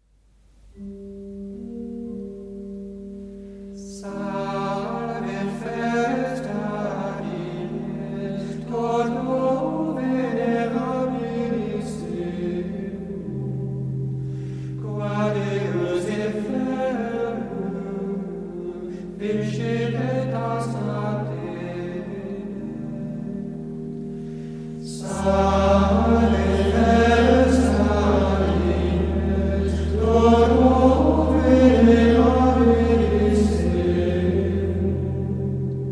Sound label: Hymn; Salve festa dies